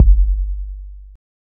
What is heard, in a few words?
subby kick single shot g key; Duration 0:01